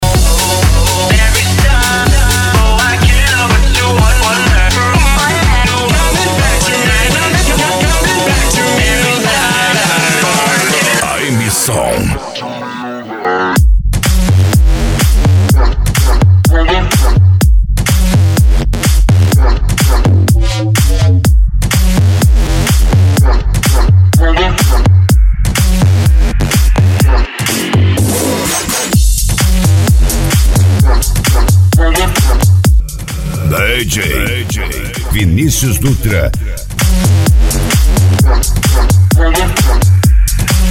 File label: especial deep house; rondonia